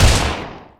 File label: rcp90 fire, weapons